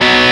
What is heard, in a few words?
x x 4 4 2 Bm; osu